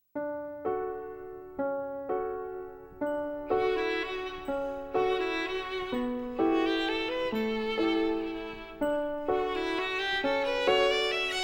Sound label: FILM MUSIC DIAL Violin